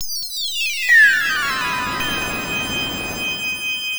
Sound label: Noise, ringtones; Google; NewWave Labs